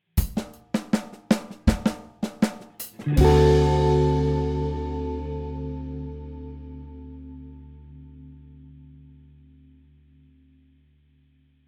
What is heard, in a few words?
lick backing